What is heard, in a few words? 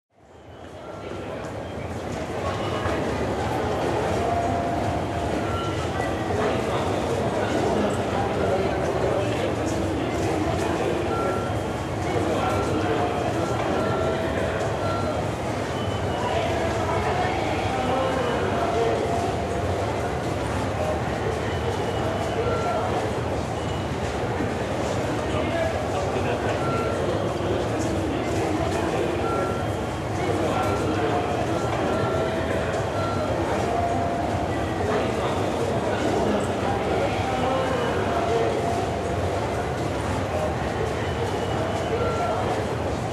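City Ambience Train Ticket Gate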